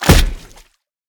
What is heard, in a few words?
hit ice; smite; god merlin